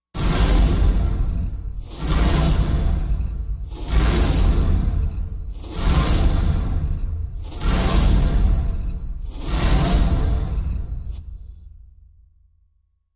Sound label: Savage Wonder Machine, machines winches